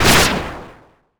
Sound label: slash